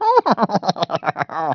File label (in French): rire; vicieux